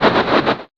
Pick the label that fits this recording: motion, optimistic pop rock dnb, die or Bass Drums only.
motion